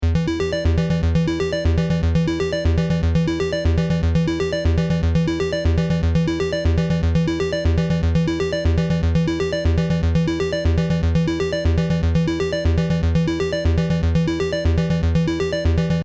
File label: synth a unprocessed